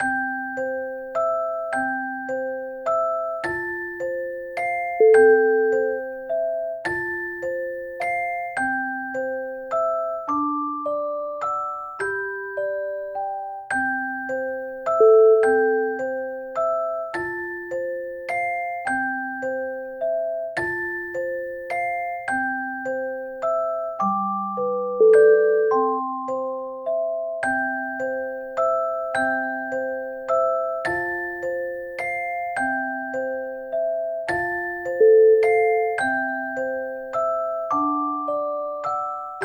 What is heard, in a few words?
babies music